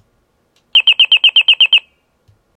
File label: Chirp